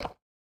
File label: stone step, footsteps